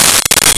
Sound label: Triangle; Nintendo; July 2020 Gigaleak